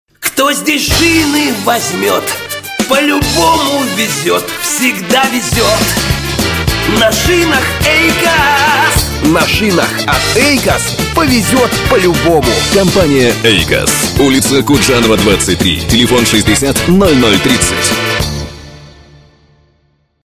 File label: Song